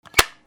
CLICKS

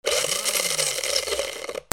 KITCHEN

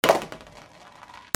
FALLING

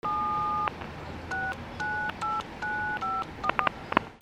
PHONES

